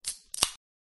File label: beer can opening; ion sound